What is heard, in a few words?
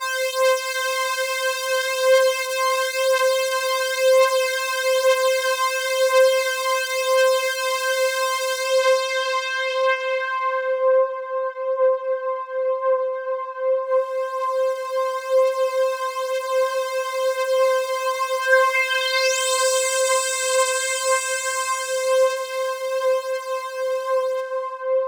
C4, CLUB ORGAN; Roland JV 2080